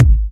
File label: Kicks; Vengeance Samples